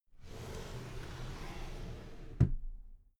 drawer cabinet paper close soft

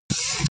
pneumatic close

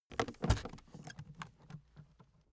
aluminium case put down

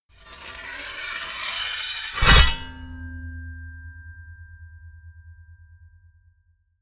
scrape

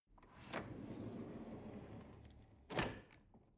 drawer cutlery open draw

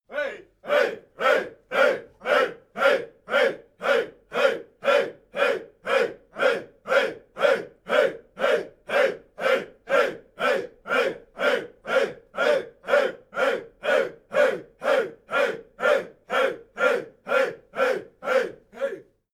chants simple

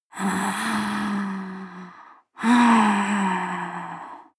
breath female moaning breath angry soft

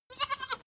goat baa lamb classic short

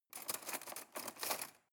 cutlery cake fork drawer handle

